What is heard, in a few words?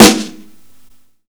Snare Warm, Drum and Bass